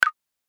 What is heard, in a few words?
notification sound